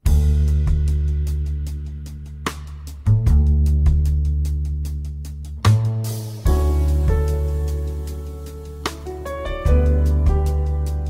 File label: Reflective Bass Solo, Wood Bass Intro